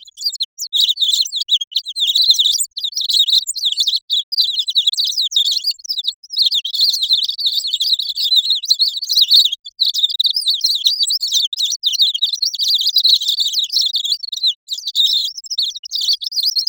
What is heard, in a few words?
synthetic artificial chic